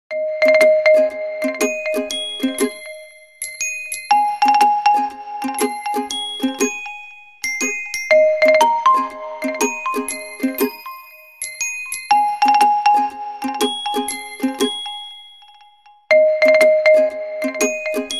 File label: Vivo Y100 Ringtone